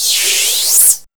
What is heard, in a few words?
SYN-FX